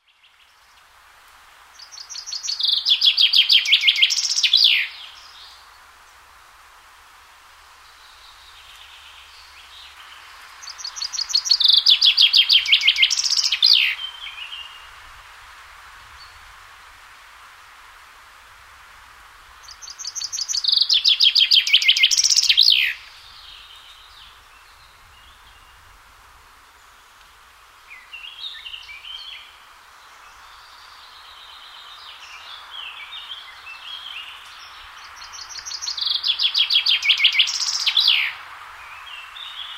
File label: chaffinch